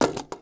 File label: blaster drop wood; objects; Star Wars Obi-Wan; XBOX